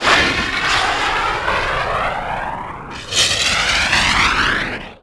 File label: rpg away; weapons; bullets